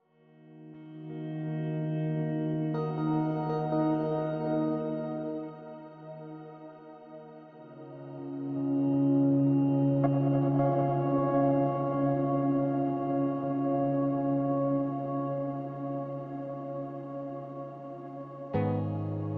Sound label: Lesser Guitars Only